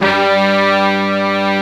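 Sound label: mix brass section G2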